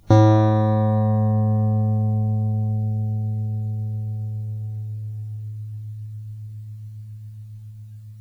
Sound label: coil, tanbur